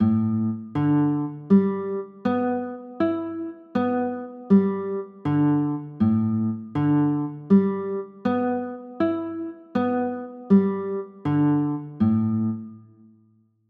a 11, chords

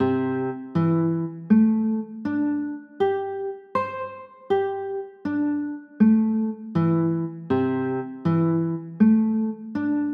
c 6 9, chords

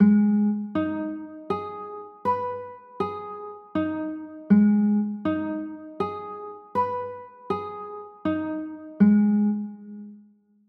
a flat m, chords

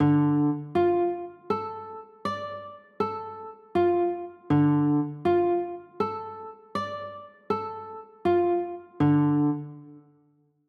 d m, chords

